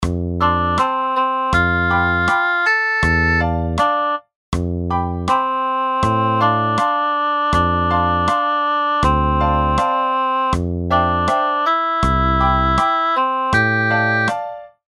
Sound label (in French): musique 3 lignes